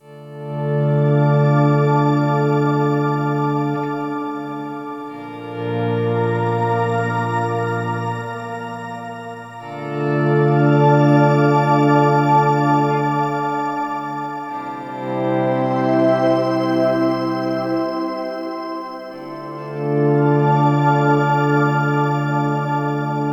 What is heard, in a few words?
Boss RV-200 Shimmer